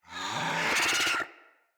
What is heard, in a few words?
Infested Charger Run Breath, Enemies